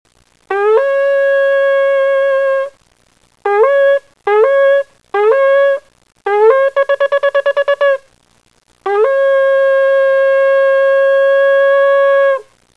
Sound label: shofar